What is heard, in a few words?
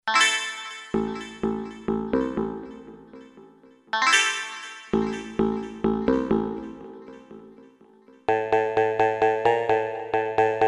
PPG PERC VOX; Akai SG01v